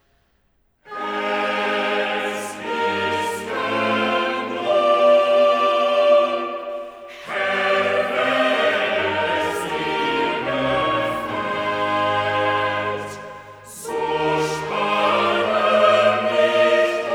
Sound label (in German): Cantata, Choral; BWV 60 'O Ewigkeit, du Donnerwort'; V. Choral 'Es ist genung'; Bach; Tooting; 22nd Sun After Trinity